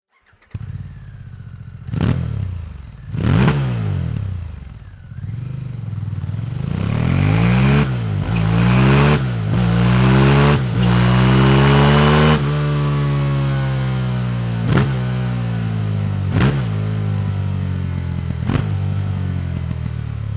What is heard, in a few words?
Slip-On HARLEY DAVIDSON PAN AMERICA 1250; Exhaust Sound